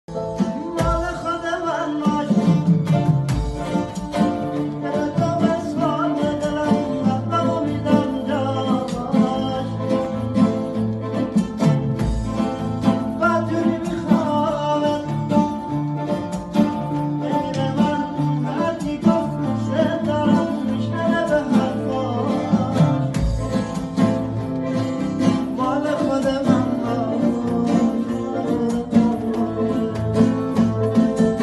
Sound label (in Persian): Ba Gitar